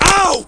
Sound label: fall; homer simpson